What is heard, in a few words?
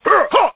woodsman atk